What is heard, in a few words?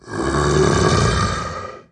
zombie